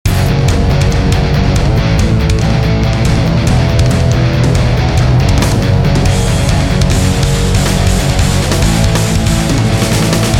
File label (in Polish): barka metal